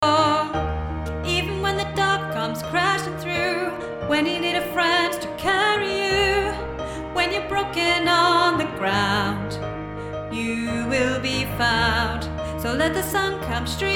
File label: alto